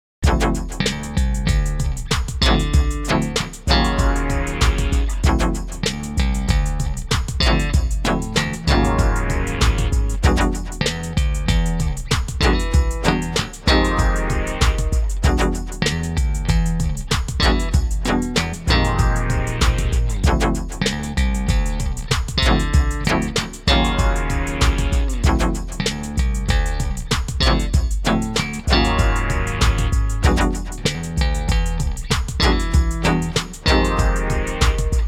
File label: mpc5k mixed sound plus bass